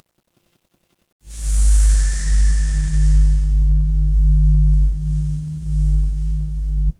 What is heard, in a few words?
horror wind whisper